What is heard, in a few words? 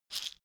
shaker wood hit double soft